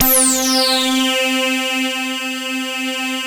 ARP C4, Analog; Analog Fantasies; USB Soundscan vol.49; AKAI

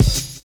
JAZZY FIL; Univers Sons

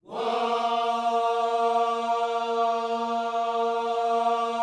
WHOA, Gospel; Spectrasonics Vocal Planet

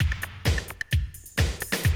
130, Classic DrumLoops

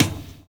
analog fi; Univers Sons - Kitch 70s

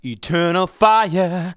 vocals sung F#; Techno Trance Essentials

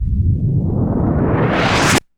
IMP WHOOSH

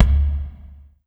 WD L, DRM HITS; Spectrasonics; Liquid Grooves

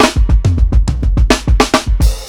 105 FILL, DRUMS LOOPS; BASEMENT DRUMS VOL 2

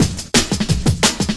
Breaks 175, Drum&Bass; EZRollers

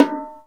Bongos, Latin; Roland L-CD701

